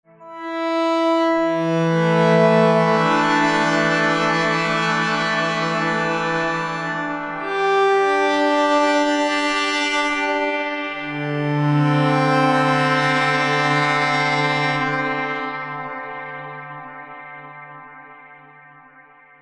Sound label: Rising MPE; Philippe Loiseau